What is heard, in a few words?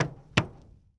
Plane P40 Step On Wing; Airplanes